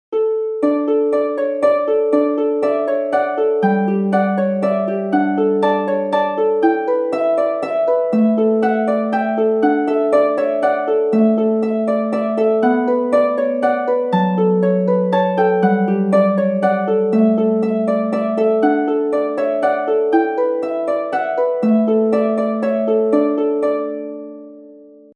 Harfe und Melodie; Gloucestershire Wassail

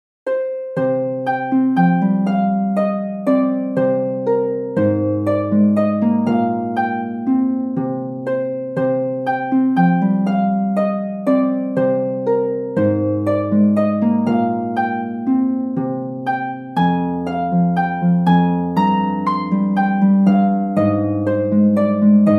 God rest ye merry gentlemen Harfe

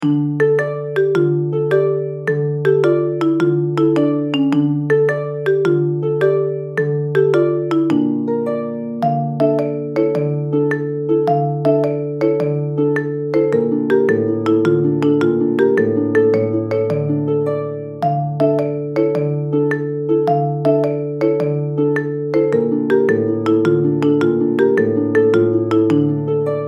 Masters in this Hall Harfe und Melodie

